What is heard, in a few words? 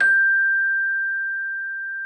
celesta G6; Xsample VOL-8